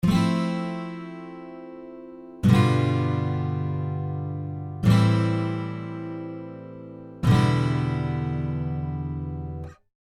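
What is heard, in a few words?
Guitar FGAE 7th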